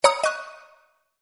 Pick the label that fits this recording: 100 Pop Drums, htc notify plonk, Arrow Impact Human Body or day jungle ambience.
htc notify plonk